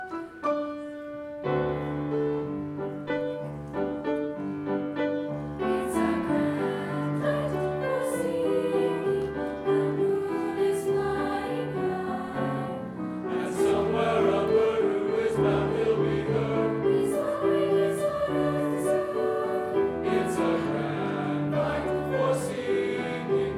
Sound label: concert choir